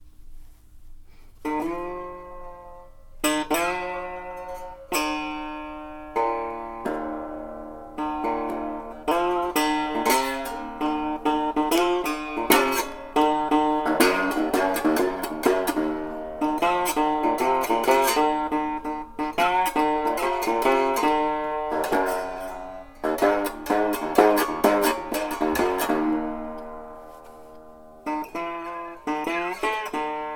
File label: guitar